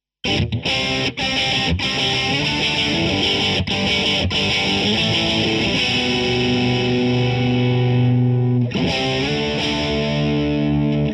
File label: Strat Classic